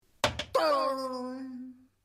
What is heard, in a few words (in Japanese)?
ビリヤード